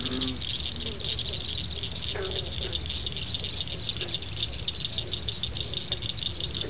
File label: cricket